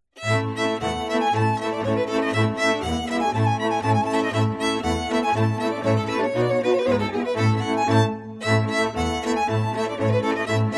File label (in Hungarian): verbunk; Suru